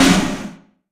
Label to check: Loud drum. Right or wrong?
Right